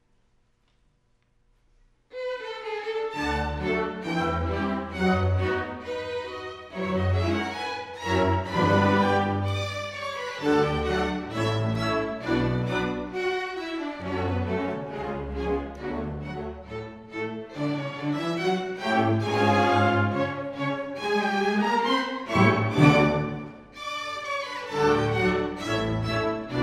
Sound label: Classical; Prodigy Mendelssohn; III. Gavotta; Silicon Valley Symphony